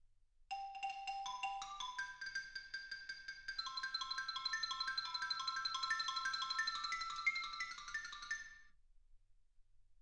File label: xylophone